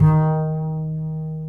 BASS L, Drum & Bass 140 BPM; Ueberschall; Drum & Bass Flash Trackz

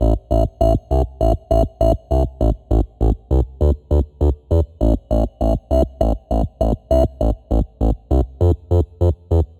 A Bass Rezy Harmonics, 100; Zero-G; Future Wave

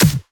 bad snare

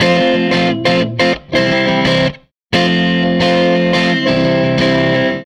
Tele Guitar Loop

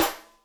Ana Sl Rim, Electric Snare, Yamaha DTXpress

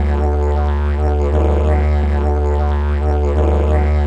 DIGERIDOO; Best Service; World Colours

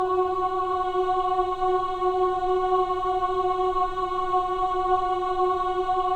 men "ah", choir of ahs

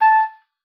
OBOE2, A5; Xsample VOL-6

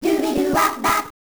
Voice Choir; Genesis

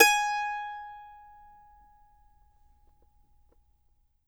MANDO NOTES, G#5; Sampleheads; Whole Lotta Country 1 & 2

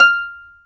HARP F6; AKAI S-Series CD-ROM Sound Library VOL-2

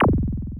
TR kit, techno kit; Pro-Rec - Synthsonic

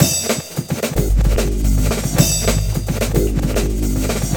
FRANTIC KIT AND SYNTH BA; Sonic Foundry (Sony Creative Software); Vir Unis Infusion

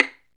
STR VL PIZ, Chamber Pizz, String Master Series; Roland - String Master Series

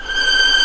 TREM VL, VLNS TREMOLO; G6; East West; The Ultimate String Collection

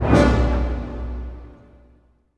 HIT, Orchestral; Orchestral Loops; 128 PSYCHO

